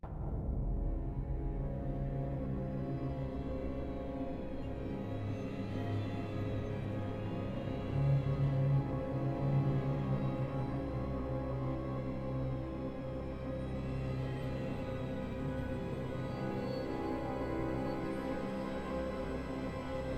Riser; N.O.V.A. 3 Near Orbit Vanguard Alliance

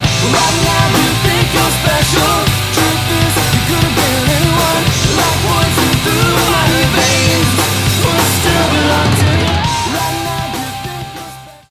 Stinger; DFH Stadium

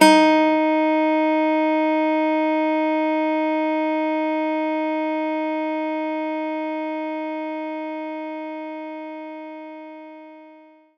Nylon Guitar; knights try

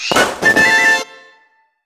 effect Jewelry Store; vivid knight switch windows gamerip 2021

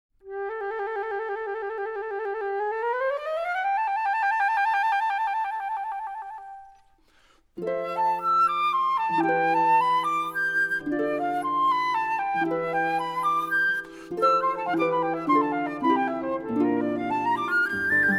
Flute of Spring; Dragon Quest V; Symphonic Suite Dragon Quest

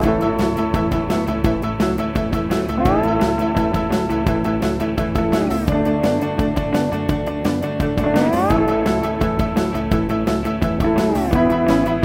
Groove Loop; Stitch; Disney Move; ps2

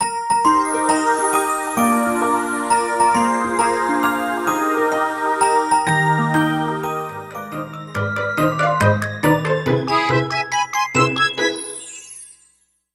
Jingle Birthday

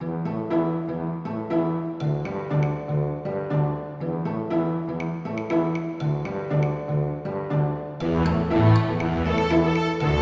Outdoors; heroes of legionwood; windows gamerip 2015

